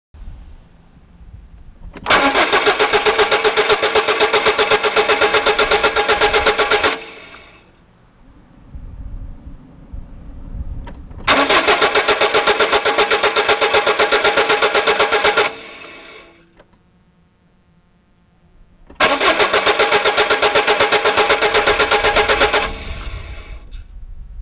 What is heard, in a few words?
flooded